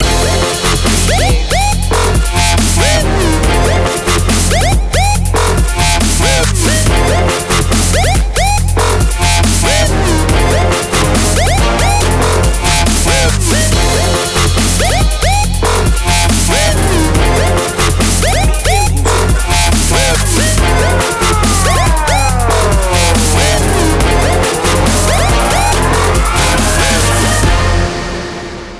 blow the horn

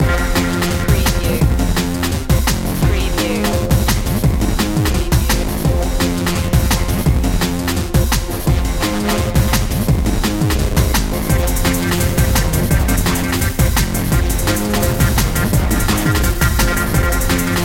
high contrast

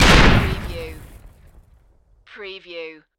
EXPLOSION GRENADE DIRT DEBRIS

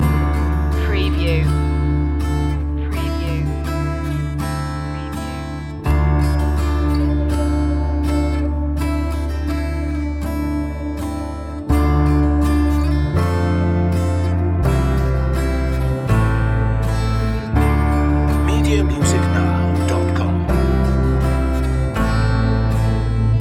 falling down loop

